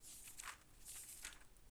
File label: Book; HapticSamples; Objects; Feel; NiceVibrations